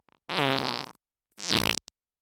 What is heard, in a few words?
fart; vegan